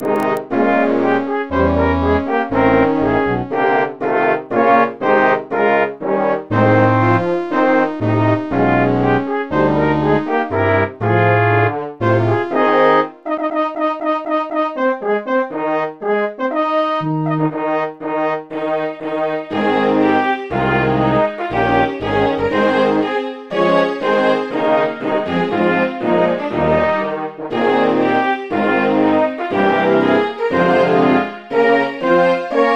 Brazil flag anthem, MIDI

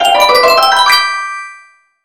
Rupee Gets Fanfare

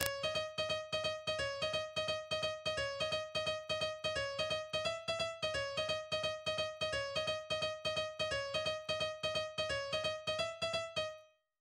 indian music

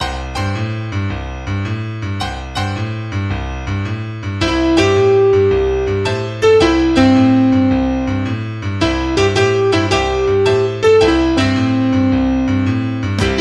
Boogie Beat

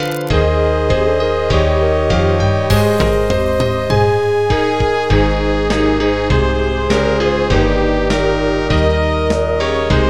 Netherlands flag anthem, MIDI

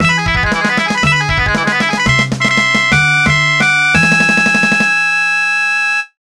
Victory

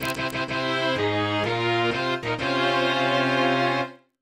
Victory Fanfare, MIDI; FFVII; TLON Friendly, all instruments

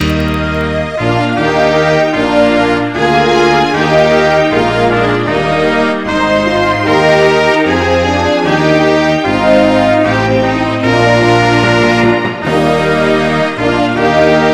DE National Anthem, MIDI

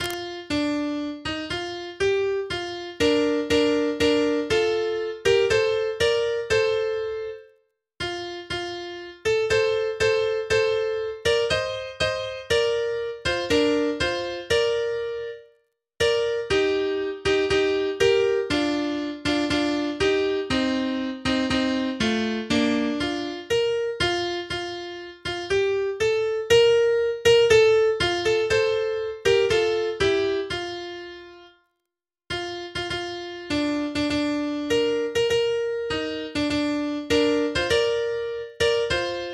South Africa flag anthem, MIDI

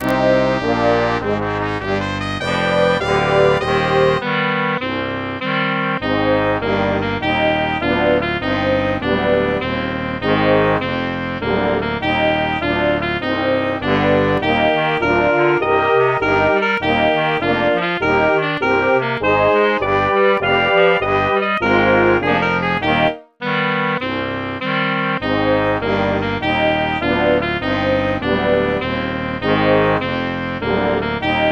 Bolivia flag anthem, MIDI

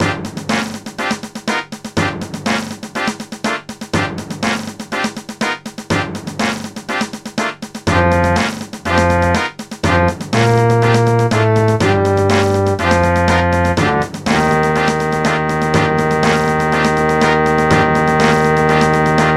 Battle; Fort Condor

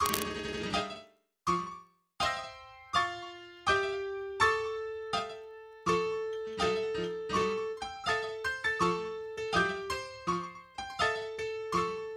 trumpet

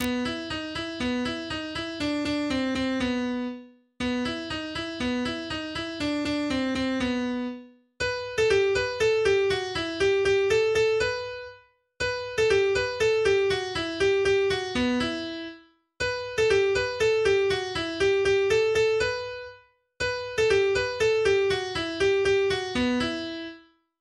drum

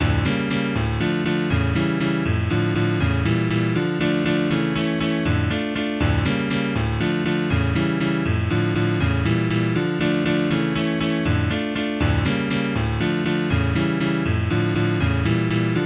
BACKING

